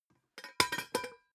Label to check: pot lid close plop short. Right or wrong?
Right